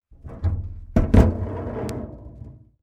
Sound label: Metal; TOOLKIT vol.2